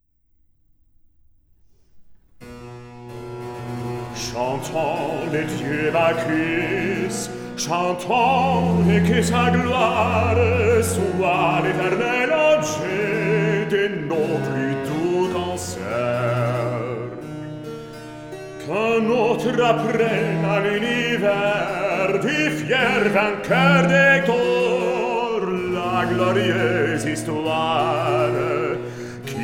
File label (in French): Recitatif; Bacchus